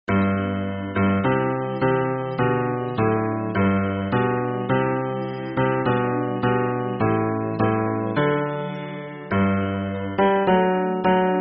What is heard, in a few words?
hymn bass